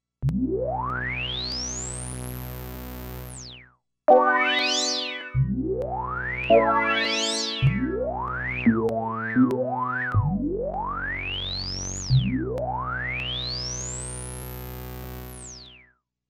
Resonance Sweep

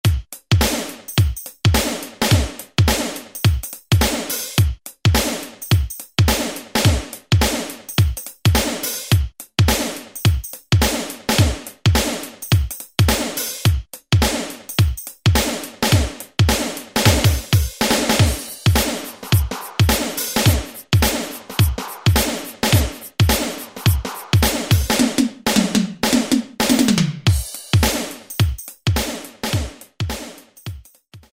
R&B, Alesis SR-16; Preset Patterns

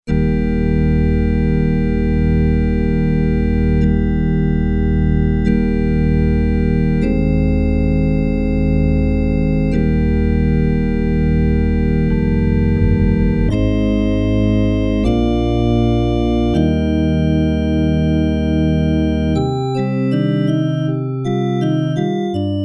cathedral organ, Korg DW-8000; Factory Patches

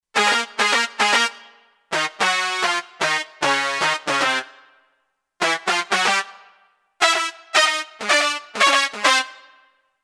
Brt Miami Brass, Kurzweil K2500X; Factory Programs

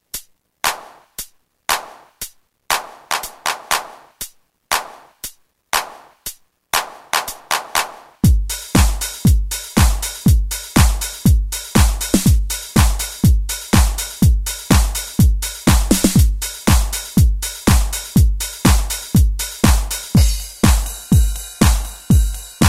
House Set, Roland M-DC1, Rhythm Sets

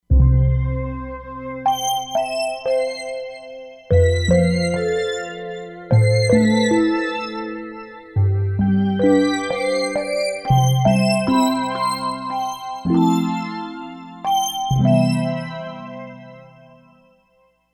Hanoi Drone, E-mu Proteus-3; Factory patches

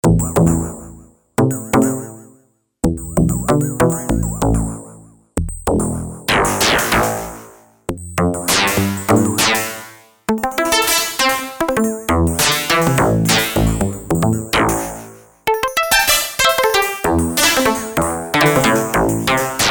Plukatan, Yamaha TX802; Factory Patches; Voices

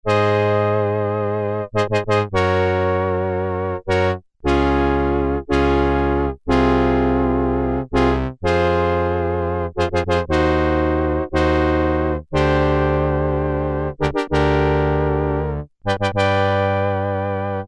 Korg Poly-800, 8-Note Brass

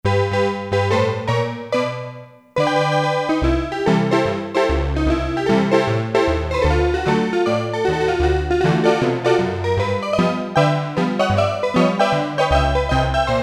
HONKY TONK, Roland Jupiter-8; Factory patches

